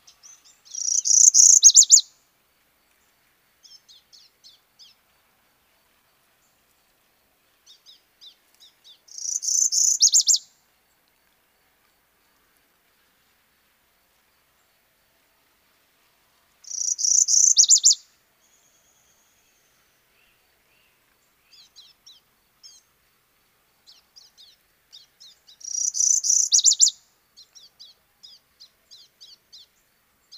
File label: Northern Parula, bird; FL; MINWR; Dummitt Grove